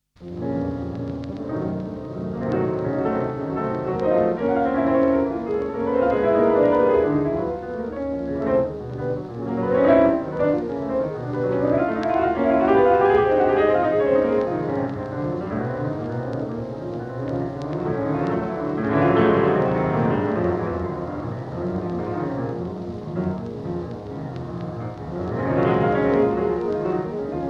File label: Piano Sonata 2 In B Flat Minor, Funeral March; Chopin; Op. 35; 4. Finale